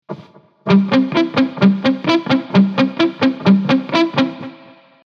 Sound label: on arpeggio